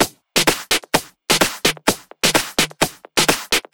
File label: Loop, 128 BPM